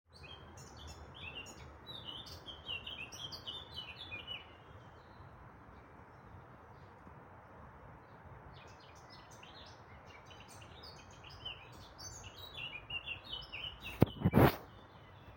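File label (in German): Dawn Chorus, Vogelgesänge vor Sonnenaufgang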